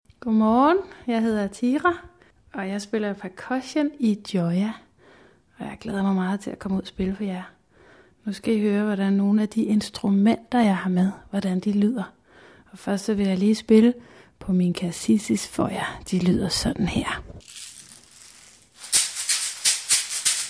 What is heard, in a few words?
percussion; tira